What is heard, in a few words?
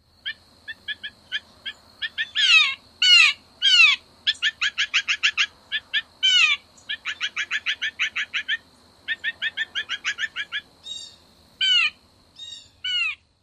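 nasal notes and jay calls; NY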